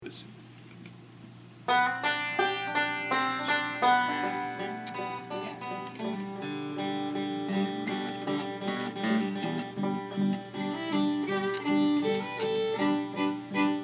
blue jam, banjo

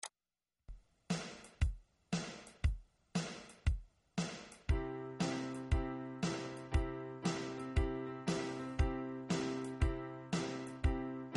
c scale, fiddle

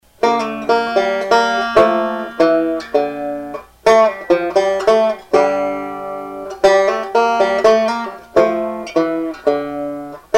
classical, banjo

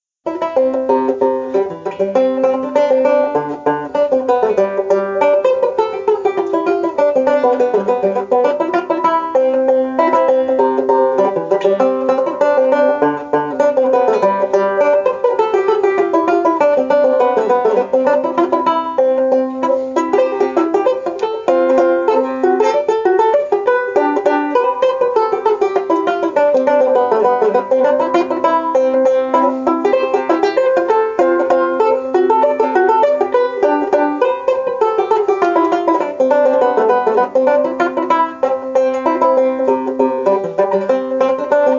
wurlitzer

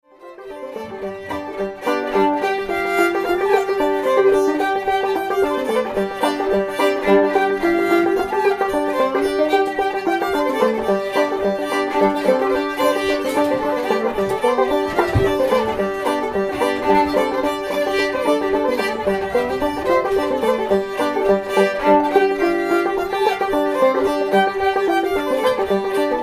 duet, banjo

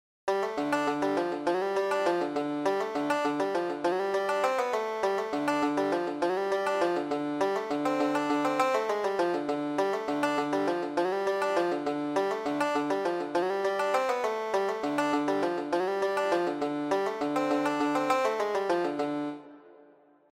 banjo

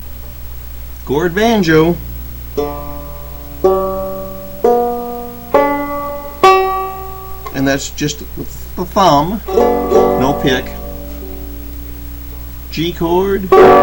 gourd banjo

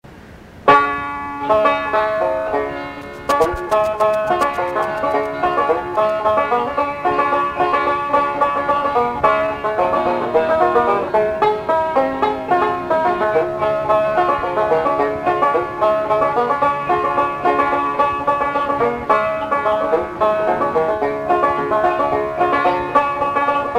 hymn, banjo

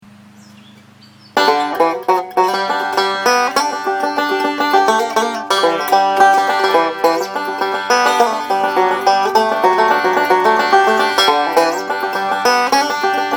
banjo

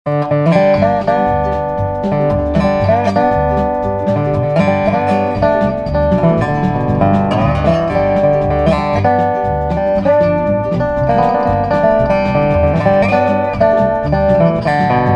steel guit

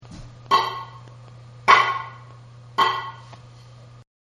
tap note

